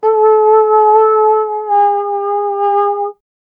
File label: cartoon sad trombone, wah wah